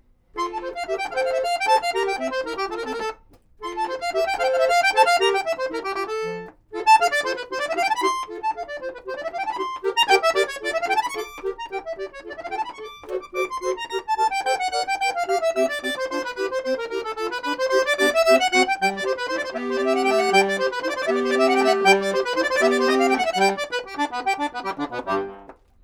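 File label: domra, Neumann TLM170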